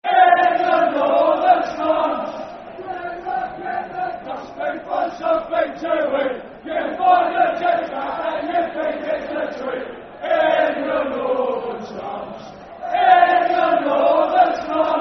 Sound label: Football Chant (Soccer Song); In Your Northern Slums; Brighton Bhafc